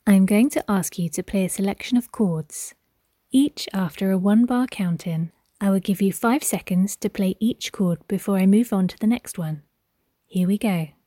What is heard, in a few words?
org chords